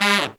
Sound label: Pop & Funk Brass, BRASS; Best Service ProSamples vol.25; AKAI